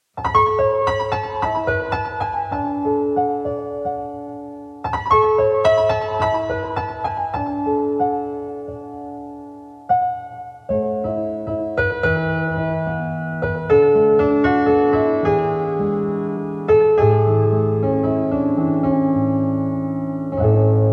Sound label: piano music 432 HZ; PRZYTULAM GLEBOKO; Przytulam głęboko